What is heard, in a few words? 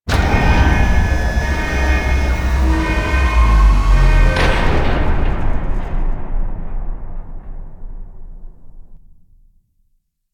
Elevator; Warframe; Jackal Redux